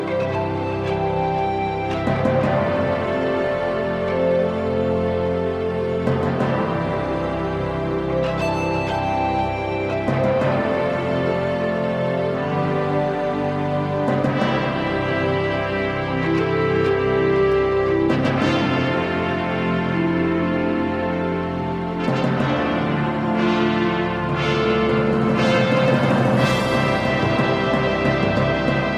Tribal Fanfare

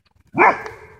Doberman bark

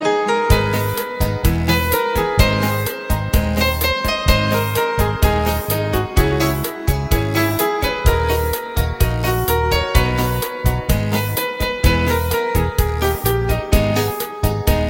piano

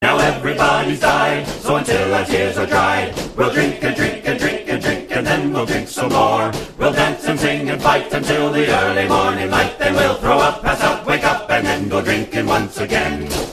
irish drinking; latino

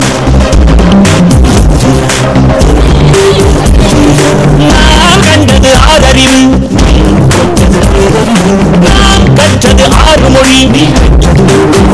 banjo

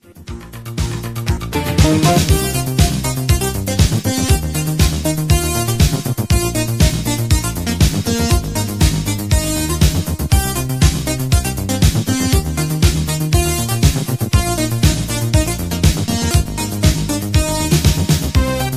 Koto

